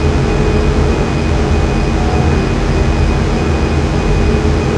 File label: turb